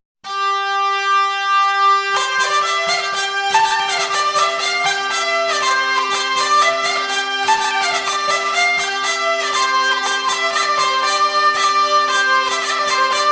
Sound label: hurdy sample